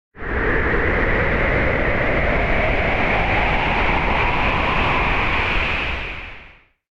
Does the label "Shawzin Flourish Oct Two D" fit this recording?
No